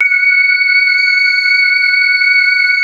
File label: METAL VOICE; Wizoo - Powered Wave